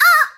Scream

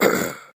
Scream

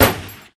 gunshot

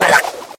Double stick

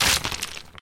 meat land

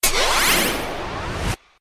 cut back

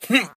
Scream

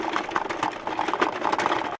Catapult move

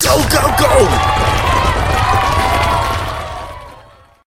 shoot

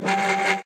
ufo open

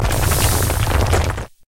land

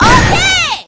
hit bat ok

